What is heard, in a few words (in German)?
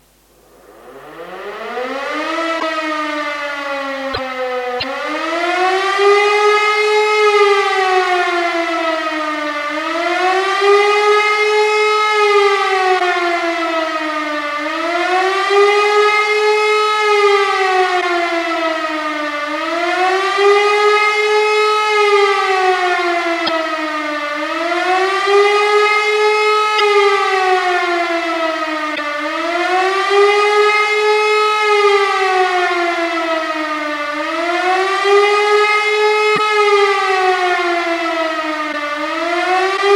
sirenenton luftalarm